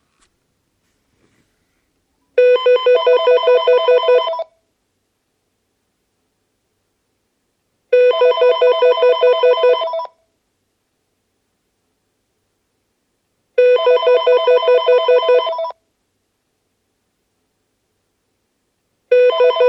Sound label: Phone Ring; Cosmos Computers